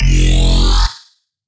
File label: Bass F Phasey, Dubstep; Bass One Shots; Ghosthack; Dubstep Sound Arsenal; 50 Bass One Shots